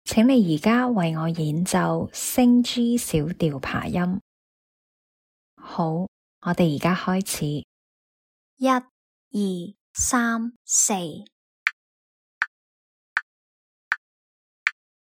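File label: G sharp minor arpeggio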